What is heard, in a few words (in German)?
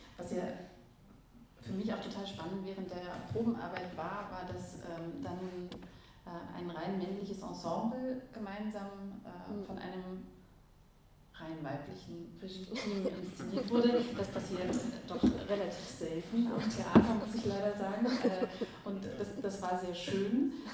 rein maennliches Ensemble